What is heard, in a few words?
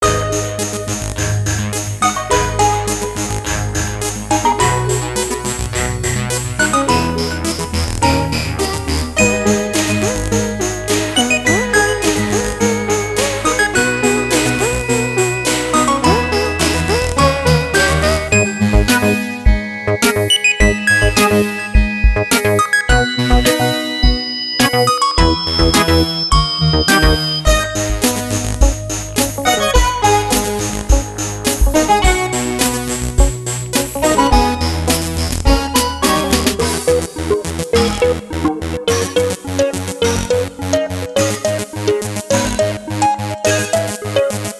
Menu Song; miniclip; Acid Factory